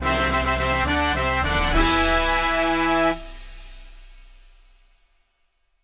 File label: overcooked victory